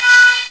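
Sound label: FLUTE; CMI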